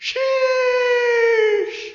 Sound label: applause; osu skins; megamix